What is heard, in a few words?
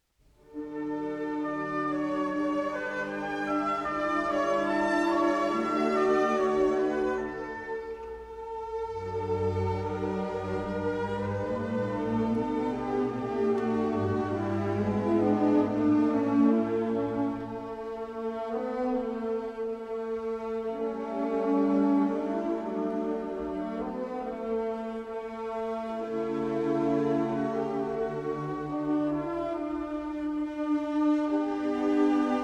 harp concerto's second movement; Dittersdorf; Legend of Galactic Heroes; Galactic Empire SIDE